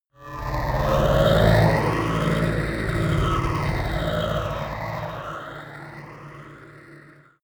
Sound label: space passing alien aircraft; raumschiff